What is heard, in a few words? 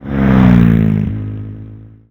Car Passby Slow; Nicktoons Nitro; arcade